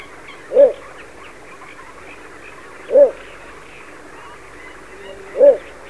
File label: Ixobrychus minutus